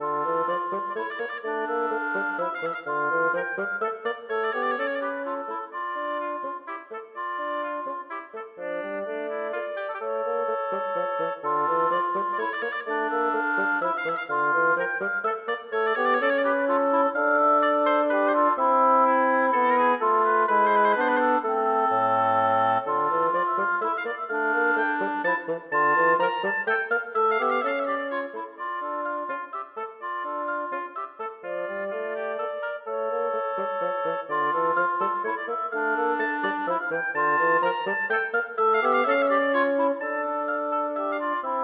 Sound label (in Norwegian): Menuet for obo, klarinett og fagott; Aamodt